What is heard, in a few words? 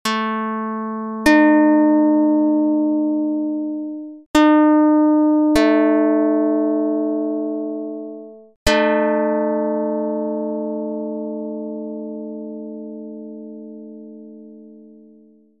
pluck; dr220